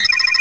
carrier missile start; Original TK Sounds

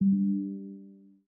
Windows Notify System Generic; Windows 11; Dark

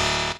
lose challenge; RS Games Sounds; I Doubt It

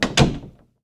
door close; Golden Crayon Sounds

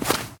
snow step; Golden Crayon Sounds

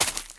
rocks step; Golden Crayon Sounds

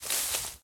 grass step; Golden Crayon Sounds

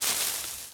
leaves step; Golden Crayon Sounds

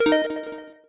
sound volume; Business skieme Beta 1

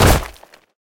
ledge fall; Golden Crayon Sounds

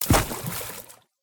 water step; Golden Crayon Sounds

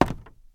wood step; Golden Crayon Sounds

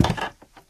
bridge step; Golden Crayon Sounds

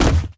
wood hard land; Original TK Sounds

